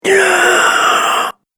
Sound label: Classic Zombie Breath; horror; Zomby